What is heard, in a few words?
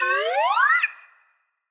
whistle UP